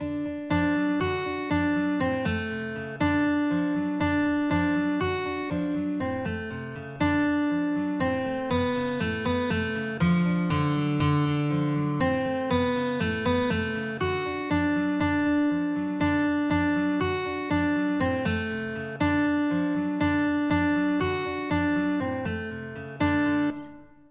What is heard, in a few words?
guitar, guitar duet